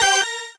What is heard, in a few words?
normal hit normal